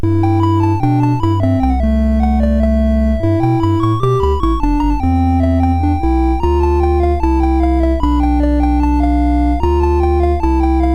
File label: Noise, MOS6581R4